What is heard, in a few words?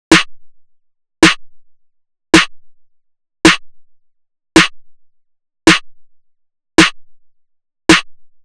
Drum Loop, 108 BPM Snare, Experimental; Drum Loops; Loop Stems; Cleansed